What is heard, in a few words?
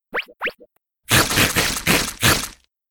attack; hero